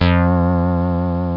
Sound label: cas synth bass